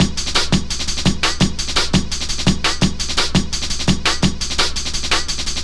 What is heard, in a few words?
Sweet Beat, Drum & Bass; Fluid Dynamics